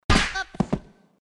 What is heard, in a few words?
death by train; Subway Surfers